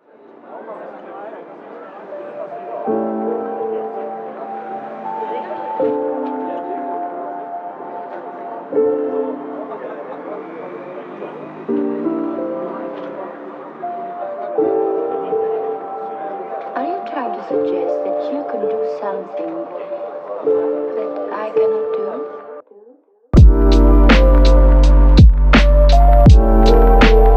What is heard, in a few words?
beat